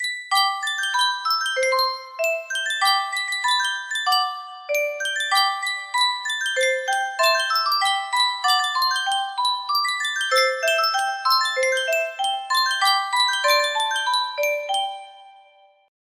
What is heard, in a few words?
yunsheng music box